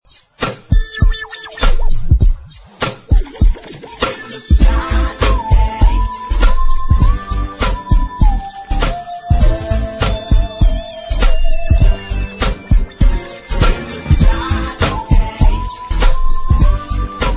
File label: cool whistle call notification tone